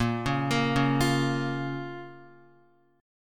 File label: A sharp Minor A sharp down Guitar; arpeggiated; JGuitar